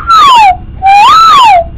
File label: chirp